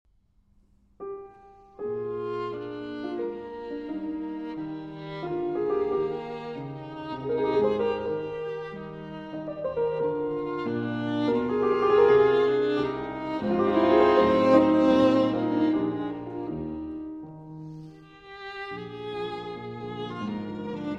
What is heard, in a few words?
Violin Sonata in G Major, Tempo di Minuetto, ma Molto Moderato; Violin Sonata No. 8; Op. 30 No. 3 II.